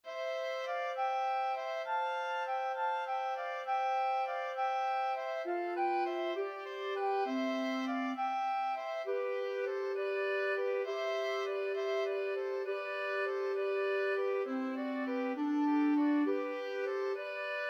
pastorale, flute oboe clarinet

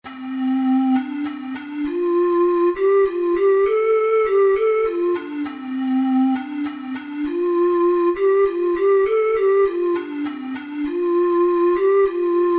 arirang, ocarina four hole

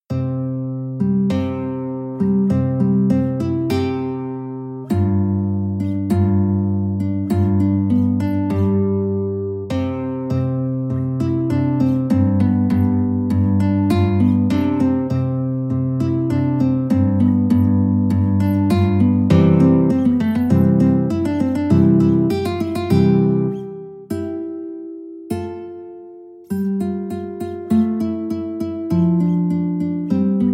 eine kleine guitar

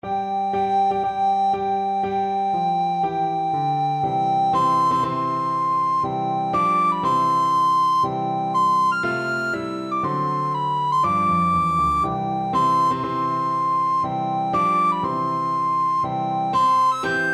wedding march, recorder

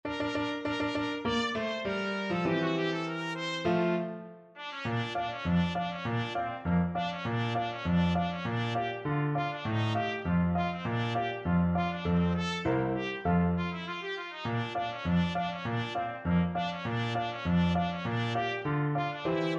march, trumpet; radetsky march

